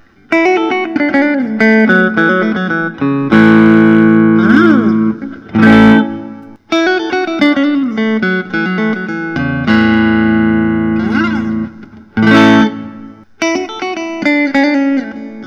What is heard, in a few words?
fender american vintage